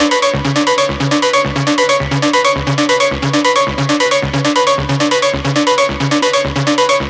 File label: Synth Loop D 135, Techno; Ghosthack; AC2024 - Day 1 - Techno Sounds 2025